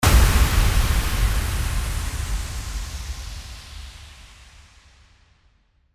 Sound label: IMPACT